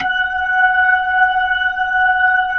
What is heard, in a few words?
B-3 F#6, Jazz; Keyboards of The 60's and 70's